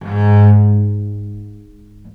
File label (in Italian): G#1, Historical Instruments, VIOLE MUT; USB Soundscan vol.23; AKAI